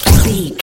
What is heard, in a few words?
sci-fi weapon blaster laser boom heavy